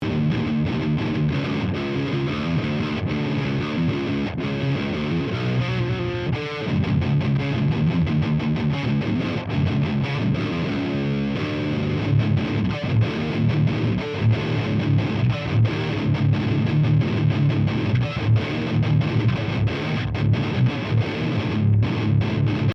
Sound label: CRUNCH MARSHALL